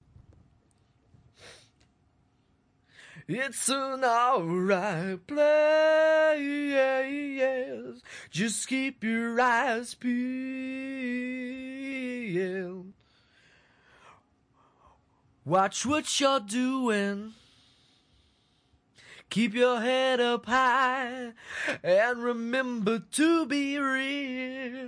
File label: male vocal, acapella, 180 bpm; vocal acapellas